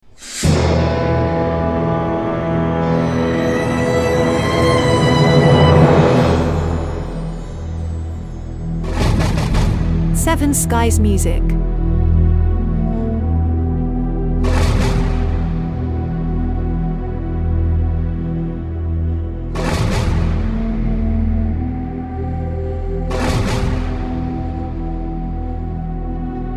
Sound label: Indian drama in Film